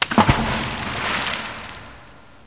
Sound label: splash